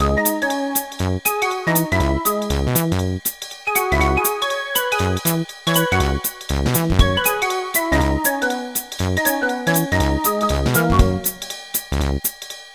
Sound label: Warped Mansion Menu